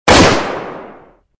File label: rifle; Gun Mayhem 2